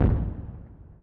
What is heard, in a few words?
Fire Works Explosion